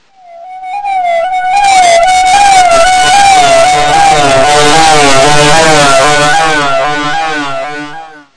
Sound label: distorted guitar noise